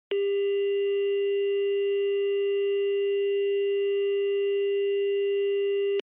Telephone Signal Tone Dial

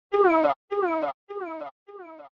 Fighting Scream; SNES

